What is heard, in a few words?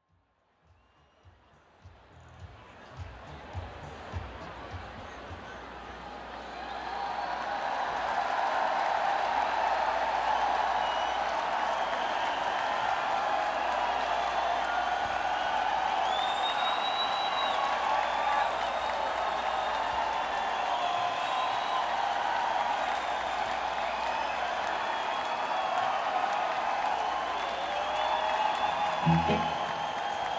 Crowd, Saratoga Performing Arts Center; Saratoga Springs, NY